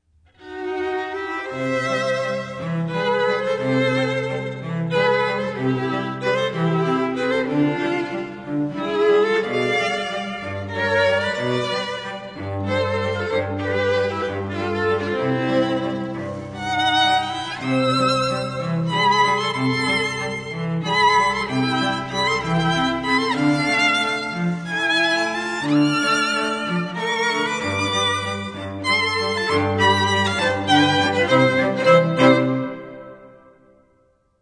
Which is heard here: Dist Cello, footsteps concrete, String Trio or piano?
String Trio